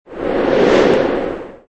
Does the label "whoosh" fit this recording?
Yes